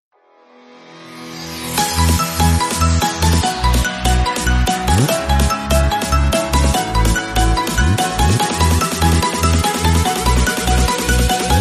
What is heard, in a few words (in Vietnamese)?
Nhac Chuong Xiaomi Remix